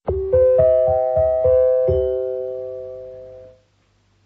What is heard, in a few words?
gong; The Springbok Gong; The Avengers on Springbok Radio